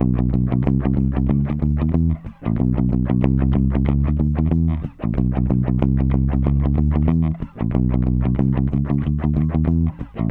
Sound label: Bass, RnB